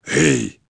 gulp; hang

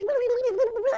brr; The Interactive Alphabet

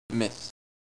missed; Netbattleship

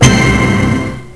Intro Song; Cool Address Book 0.61

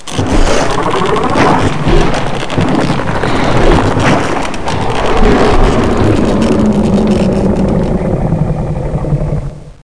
morph

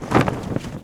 crushing tyre; 1944 Battle Of The Bulge

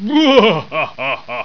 Evil laugh; After Dark; Reality AD

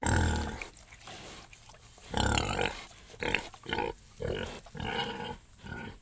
PIG; Media-Pedia; animals

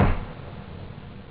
long, drums snare; OctaMED SoundStudio V1

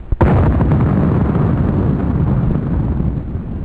Tank Burst, Military Sounds; MineSweeper v3

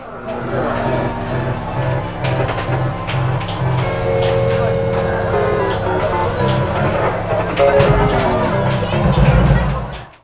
arcade; Digital Sound Gallery - Volume 1; fun game

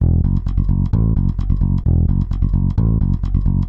Groove, 130, F

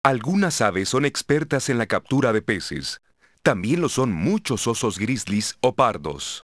HARP

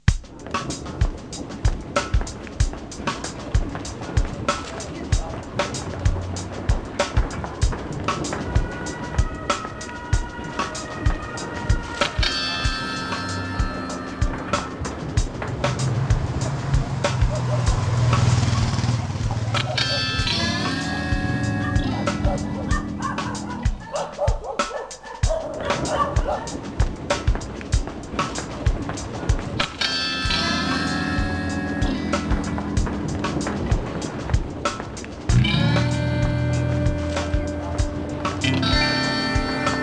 ambient

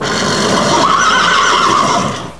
HORSE; Kids' CD-ROM Bible Fun Pack; Education Publishing Concepts; NOAHDEMO

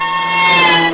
Zoom by

